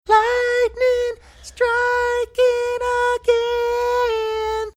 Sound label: singing lightning striking again